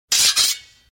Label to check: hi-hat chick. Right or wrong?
Wrong